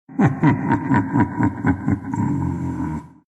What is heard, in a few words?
laugh ru; dota2